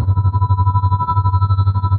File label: Trem; Inspired by Nature by Dillon Bastan; Tree Tone Presets